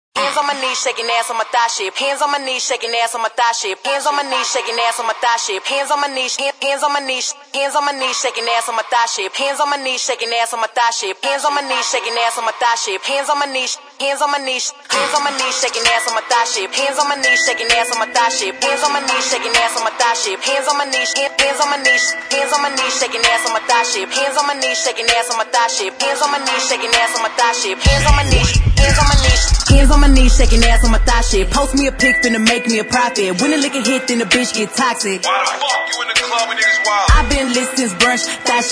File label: Blend Acap In Out